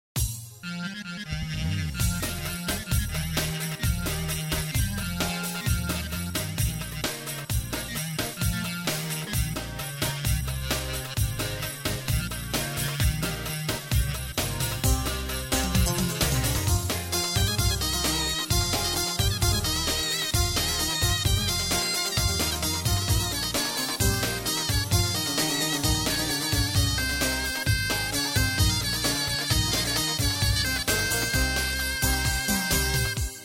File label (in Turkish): Halk 4-4